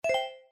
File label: win